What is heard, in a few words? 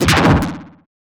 stylized glitch sound; soundscrate